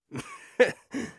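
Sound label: laugh evil, German; Böses Lachen; Scout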